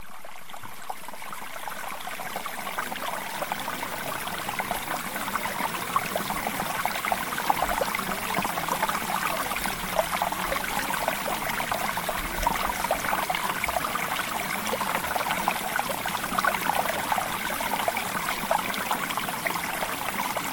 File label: Mountain Stream